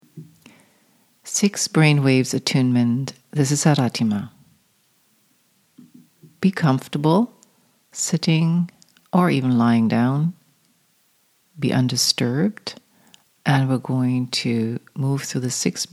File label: six brainwaves attunement